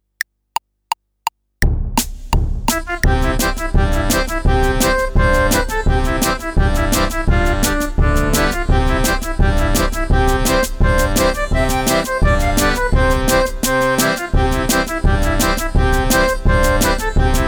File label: Irish Tune fast, fife